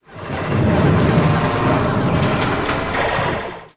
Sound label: Arcade; blue archive